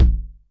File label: Electric Tom, Yamaha DTXpress